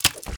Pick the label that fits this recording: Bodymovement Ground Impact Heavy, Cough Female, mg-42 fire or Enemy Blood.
Enemy Blood